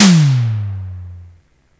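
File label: Simmons tom, SDS 8 Tom (Long)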